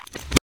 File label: sticky bomb unstick; Original TK Sounds